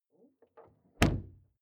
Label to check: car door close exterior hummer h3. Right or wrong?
Right